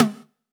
Tom Halted, 909 Toms; Slate; Vintage Drum Machines Volume 3 909 Edition

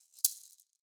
maracas Single Hit Both Hands; Raw Perc One Shots; Slate; SD SEISMIC Drum Sample Pack

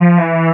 vocal august Gm; Slate; AURORA Sample Pack; Vocals

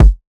kick dumpty; Drum Sample Pack; One Shots; Slate; SEISMIC

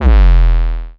808 Bass Spread Out E3; Slate 808 Deep Dive; SD Signature 808s

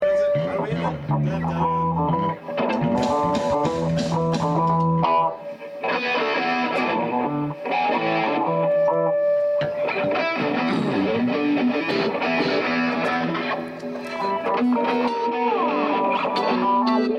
Background Noise Mix

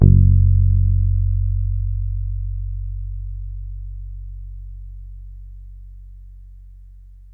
Bass Low F#, Electric Bass One Shots; Slate Digital BASSHOLE Sample Pack

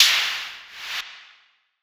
cymbal recoil; Hip-Hop; Drum One Shots; SD CAICOS Hip-Hop Sample Pack; Slate

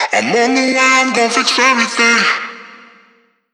phrase Bb, Vocal; Slate Digital Omnivox Vocal Sample Pack

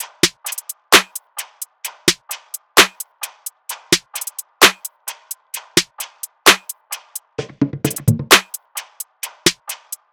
tops 130, Drum Sample Pack, Loops, Top Loops; SD SEISMIC; Slate

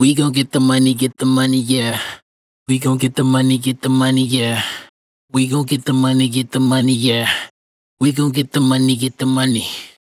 hook, Dry Vocals; Raw Vocals; Get The Money; Slate Digital Omnivox Vocal Sample Pack

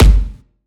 kick one shot big, drums; Pop; Slate; SD PASADENA Pop Sample Pack

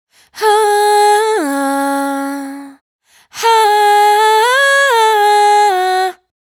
riff 160 C#m, Vocal, Dry Vocals; Raw Vocals; Slate Digital Omnivox Vocal Sample Pack

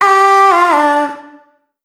phrase Dm, Vocal

